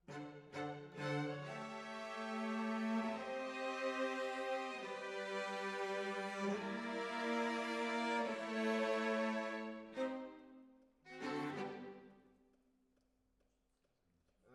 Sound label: Decca, Jazz for piano